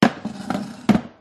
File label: pub wooden chair movement on tile floor; field reports